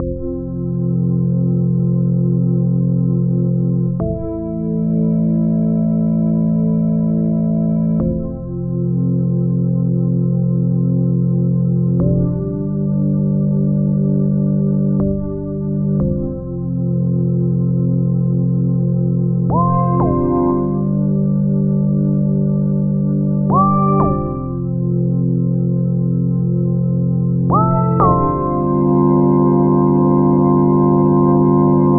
Pad, Modular Electronica; Sonic Foundry (Sony Creative Software); TSS Autopilot

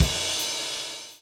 DOWN, DOWN KIT 78

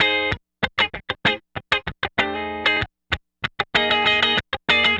Guitar, 70s Analog Funk

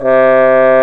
Trombone; Solo Brass

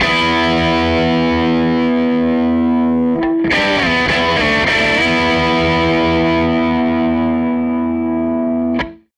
Gretsch Guitar Loop

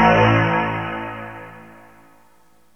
Bass (low harmonic); Sonic Foundry (Sony Creative Software); Ugly Remnants VOL-2; Basstuff

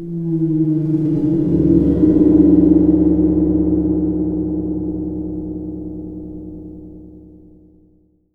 SUPER BAL, GONGS; Voice Crystal - Steve Reid Definitive Percussion

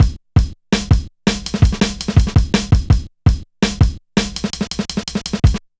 Persistent, Jungle, Drum Loops 165.5

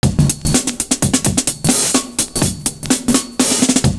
BEAT, loop; Univers Sons - Kitch 70s

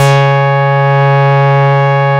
MINI, Roland Synth Bass, Analog Bass; Roland - Rhythm Section

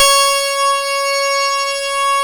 C#, PIANO; Big Fish Audio; Synclav FM

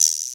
Vibraslap, EMU Xtreme Lead 1

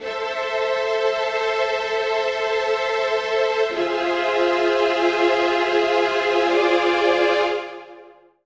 Body Snatch Strings; Zero-G Smoke

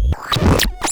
Cwejman S1 - Mechanical Arm

